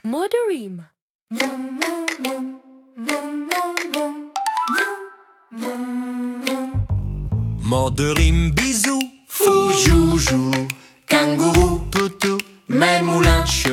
bisou balou le kangourou, comptine rythmique

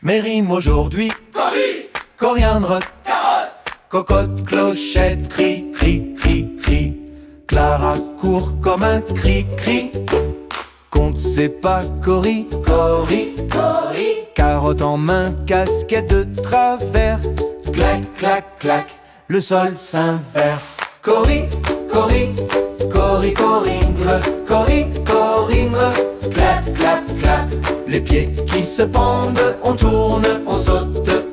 clara court comme un cricri, comptine rythmique

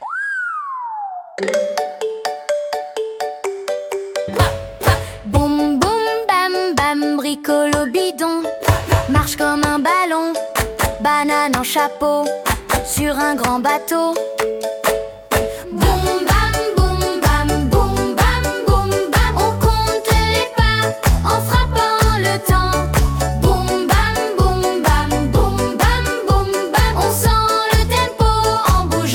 comptine rythmique; boum bam bricolo

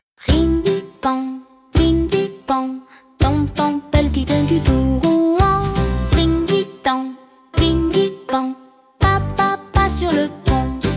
comptine rythmique, p'tit pingouin qui saute

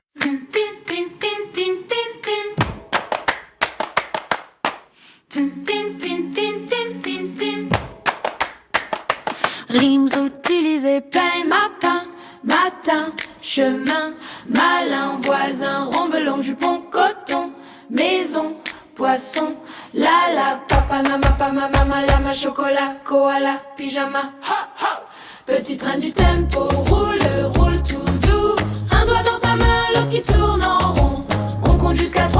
comptine rythmique; petit train du tempo

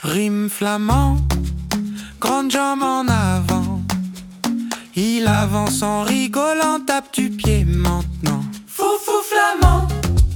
comptine rythmique; fou fou flamant

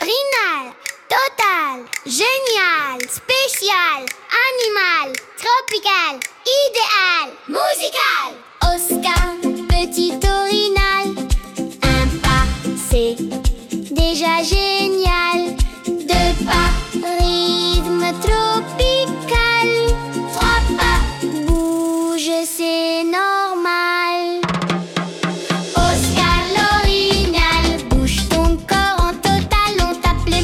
comptine rythmique, oscar le petit orignal

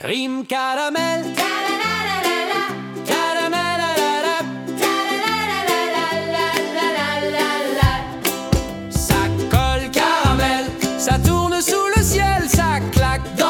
caramel qui tourne, comptine rythmique

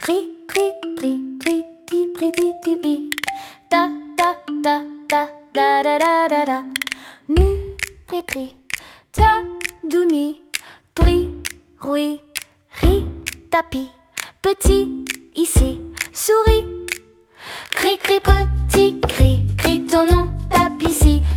crik crak dans la cour, comptine rythmique

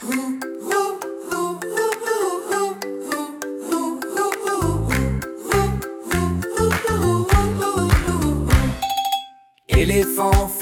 éléphant qui fait des étincelles, comptine rythmique

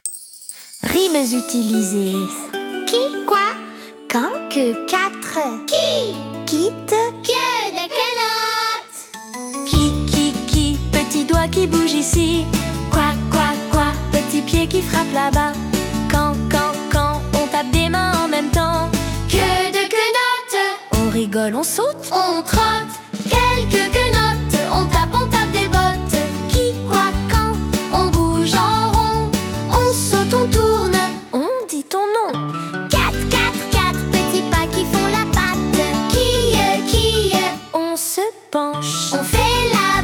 comptine rythmique, quelle queue de quenotte

